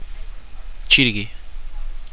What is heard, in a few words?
punch